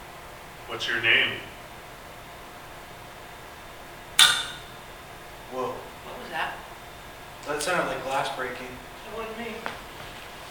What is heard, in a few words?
glass Breaking